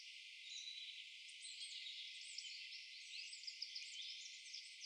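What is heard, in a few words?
birds loop low pass filter; ambient animal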